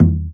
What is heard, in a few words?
Casio CTK-611 Low Taiko